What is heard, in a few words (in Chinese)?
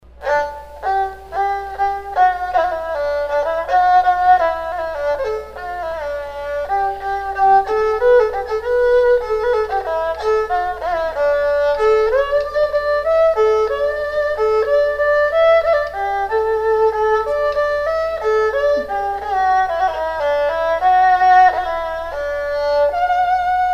二胡; erhu; 从来就没有拉会过二胡，现在这把二胡是去年回国带来加拿大的。